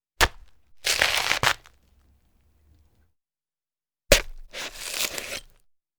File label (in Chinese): 用刀刺、刮、切